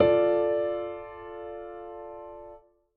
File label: Piano D3